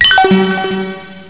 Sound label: level; worms